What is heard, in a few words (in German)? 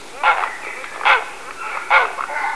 graureiher